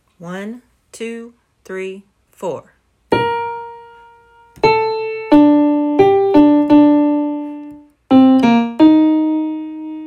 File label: winds